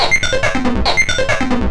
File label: Atonaloop, Industrial Analog